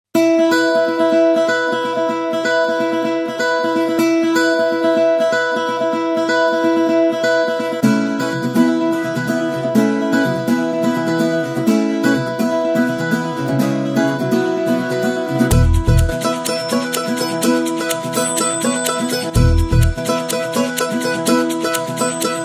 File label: Starting Musik